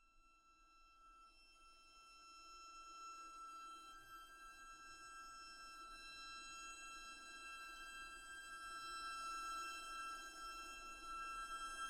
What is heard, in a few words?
tone